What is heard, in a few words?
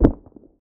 foot carpet, footsteps; midnight guns